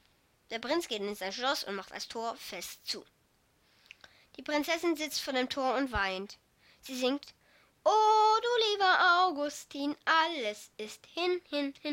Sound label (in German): Schwein Ton